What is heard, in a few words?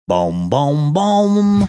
singing first few notes